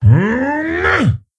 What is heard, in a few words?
Attack; King Ymiron